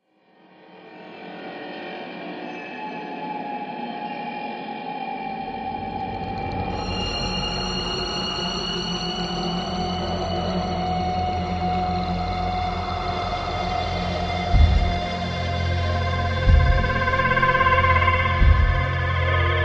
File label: Sci-fi Horror